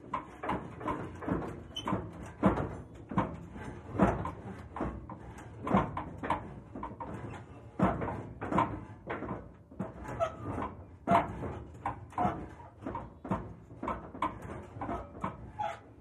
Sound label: Car Trailer Jiggling; hits & rattles